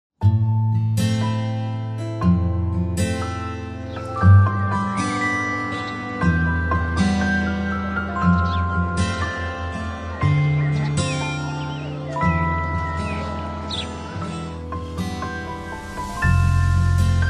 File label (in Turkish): Enstrümantal fon müzik Hüzün Keman